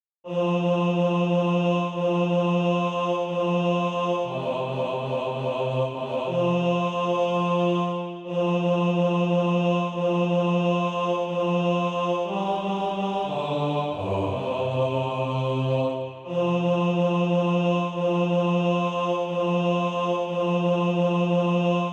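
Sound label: bass, SATB Practise Music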